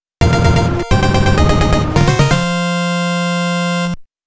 PATTERN CLEAR; konami antiques family computer 2023; PATTERN CLEAR NO.1 (パターンクリア1) (SUPER魂斗羅)

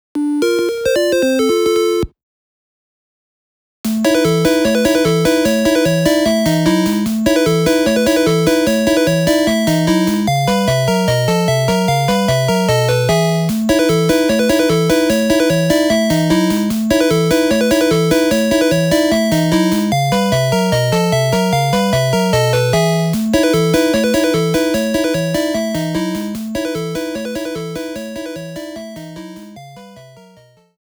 Awful Ukelele; Nekojara Monogatari; Lottery House

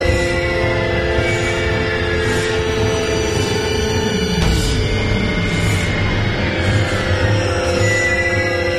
Tense Loop

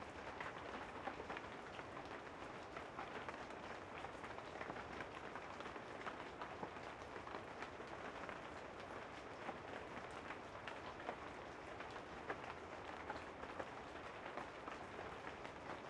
amb rain tent int; chicory a colorful tale; ps4

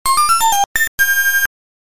Extend Music; arcade; Dig Dug

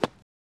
Bunker Shot

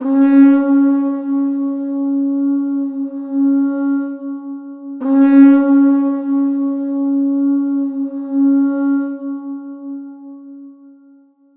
War Horn; gamerip; Heroine Anthem Zero 2 Scars of Memories

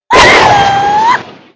Red Launch; Angry Birds Space

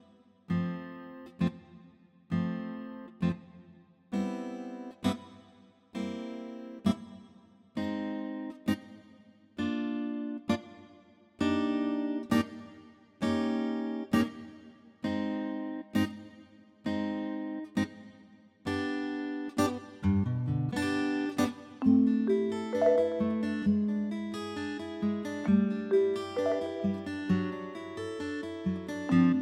loop 132bpm

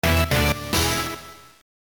Item Obtained; Dragon Ball Z; GB; Legacy of Goku

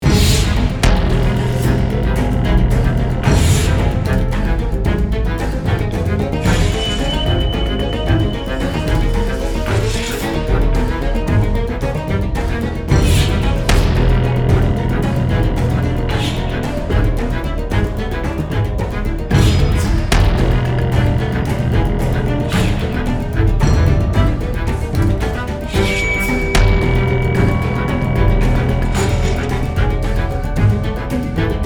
Action loop

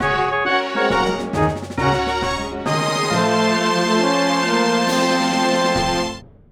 Castle Clear Short

